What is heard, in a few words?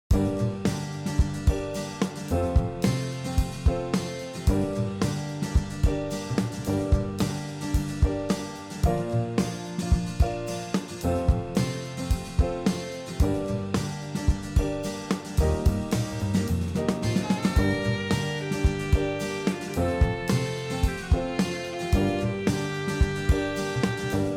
barka shuffle